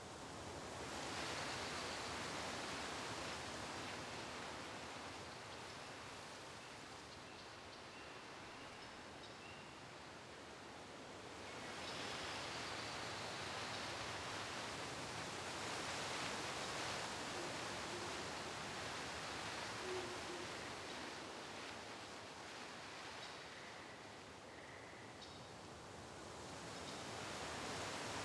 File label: Forest Soundscape